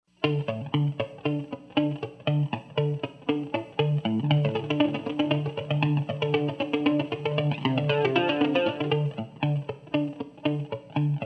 Rhythm and Fills with and without Delay

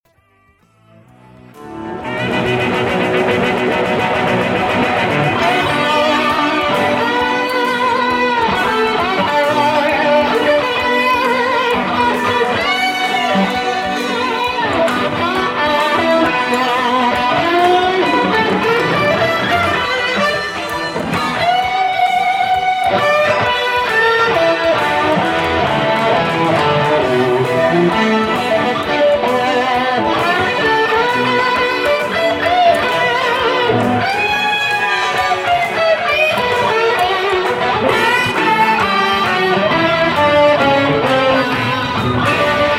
solos isolated

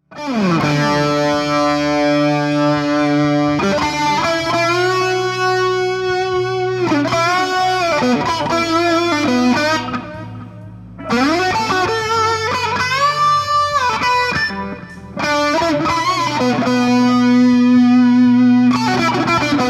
SSL-5 VS EMG MUFF

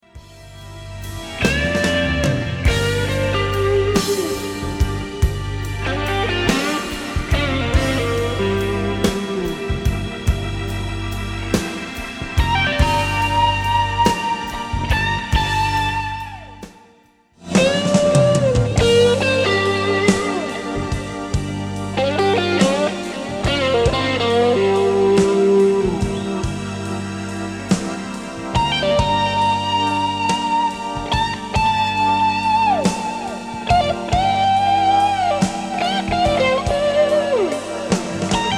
Solo

